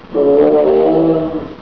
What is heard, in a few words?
moan, tiger